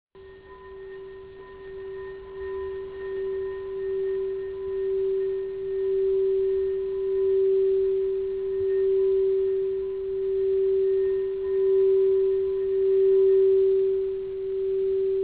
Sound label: Crystal Bowls, crystal quartz singing bowls